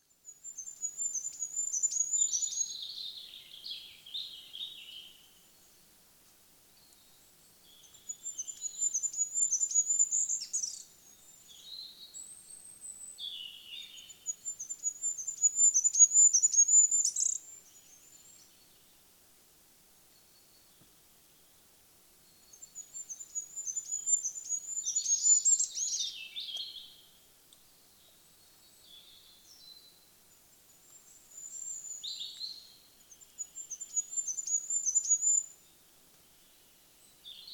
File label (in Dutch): Goudhaan